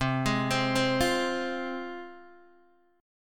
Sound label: C Suspended 4th Sharp 5th, C, down, Guitar, DADGAD